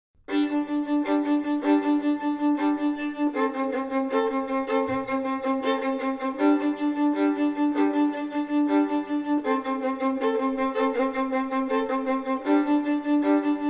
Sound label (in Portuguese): violino solo